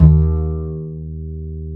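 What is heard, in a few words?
double bass D#2, Jazzistic; Univers Sons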